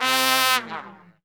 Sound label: C2, section horns